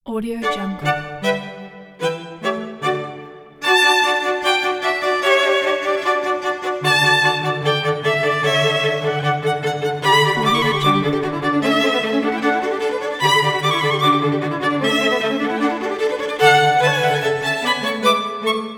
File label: divertimento in d major presto